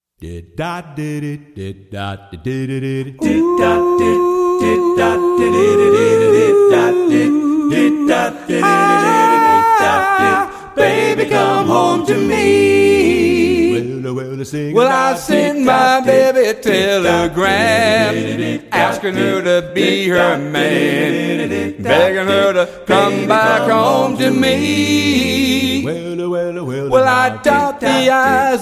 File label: morse code